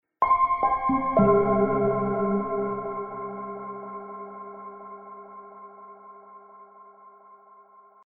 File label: Like water falls